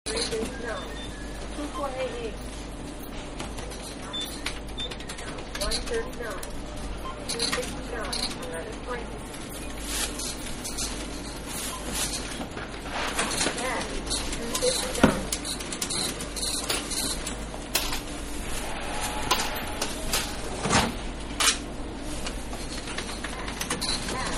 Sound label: Supermarket Register Area; Stores and Malls; Backgrounds; Manhattan Production Music